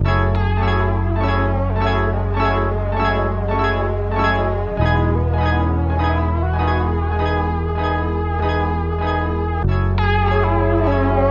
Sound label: keys, phonky loops